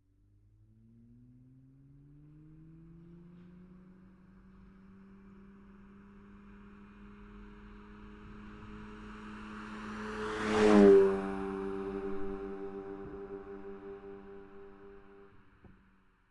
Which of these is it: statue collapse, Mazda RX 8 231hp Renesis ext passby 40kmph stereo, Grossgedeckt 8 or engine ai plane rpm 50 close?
Mazda RX 8 231hp Renesis ext passby 40kmph stereo